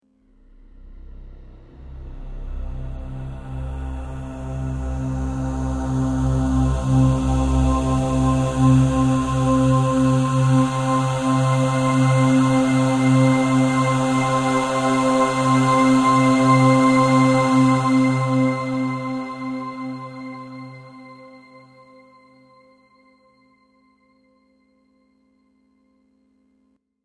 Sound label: Heavenly angelic breathy voice transition; Airy; Sound Design